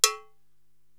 Mambo Bell, Korg TR-Rack drum machine

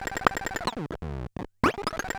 Bent Talking Teacher, Circuit Bent

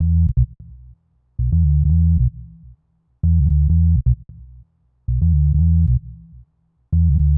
Bass Loop 130; Music-Fx Loops 130; Equipped Music; Loungin House

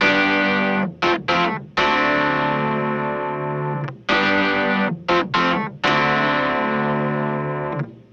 Clavinet, 70s Analog Funk; Sonic Foundry (Sony Creative Software); What it Is!; Good Times

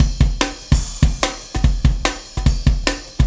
Warp Drive Beat, Processed Drumkits; Sonic Foundry (Sony Creative Software); Processed Drumkits Zero Gravity Beats

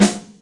Fusion, Yamaha DTXpress Acoustic Snare

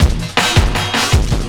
LOOP L, Drum & Bass 160 BPM

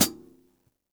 hihat - close; JazzFunkKit

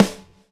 95 groove; AMG Gota Yashiki Groove Activator

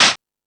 Dance 2000; E-MU Pro-Cussion

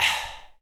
VOCAL AH; ILIO; Synclavier Strings

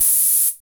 HH, HH Synth; Sound & Vision - Gigapack II; HiHat Synth

